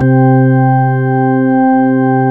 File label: DEEP ORGAN; Zero-G; Funky Elements